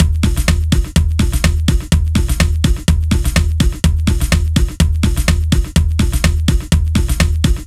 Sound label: 125 Forest Break